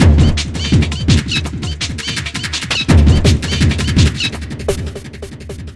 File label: FXBEAT